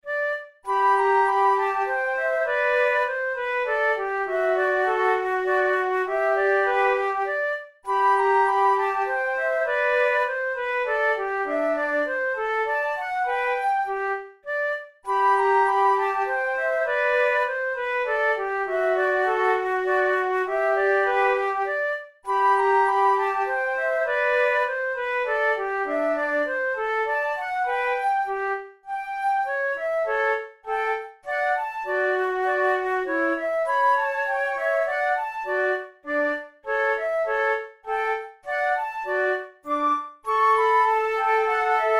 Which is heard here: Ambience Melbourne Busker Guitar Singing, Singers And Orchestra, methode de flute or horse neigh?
methode de flute